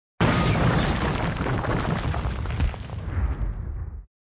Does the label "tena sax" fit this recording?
No